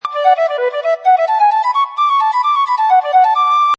Irish Flute Melody2 Loop Dmajor 130Bpm, Irish Flute Loop In D Major At 130Bpm; Woodwind Loops